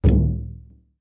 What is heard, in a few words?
foley balloon large bounce; sound effects one